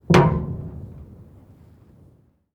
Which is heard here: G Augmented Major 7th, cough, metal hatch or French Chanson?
metal hatch